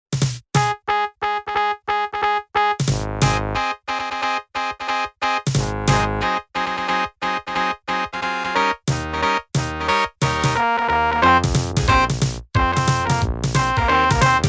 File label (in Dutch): intro incl Baritonsax blaaskapel; Muziek Makerij Heeze